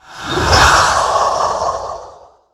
Arcane Anomaly Play

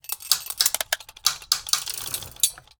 Mechanical Work Underlay Play

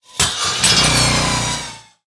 Circular Saw Underlay Death

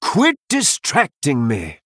male blood elf, Attack

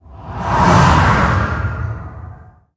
Attack; Lightspawn

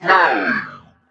Mech Emote Death

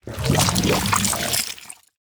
Squirming Tentacle Play

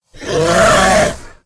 Dragon Consort Enter Play

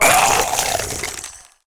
Bone Construct Death

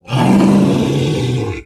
Kodo Attack; Druid of the Plains

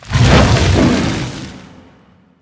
Firecat Form Attack

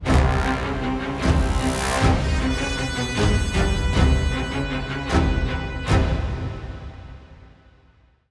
Legendary Stinger; Crabatoa

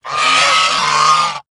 Whelp Death

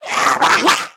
Nether Imp Attack

